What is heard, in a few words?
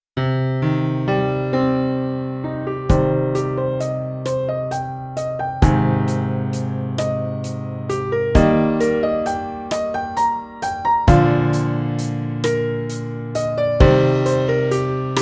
ballad piano drum kit; balada